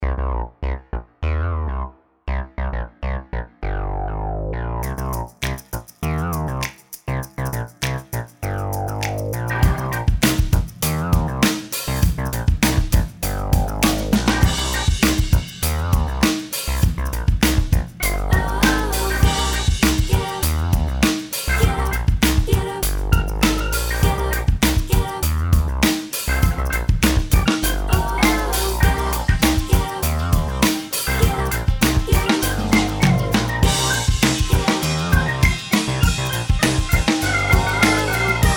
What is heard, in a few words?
Funk Warm Up